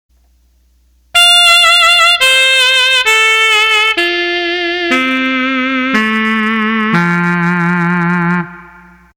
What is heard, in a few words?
Saxophone